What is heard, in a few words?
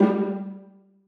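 violas pizzicato f#3; Sonatina Symphonic Orchestra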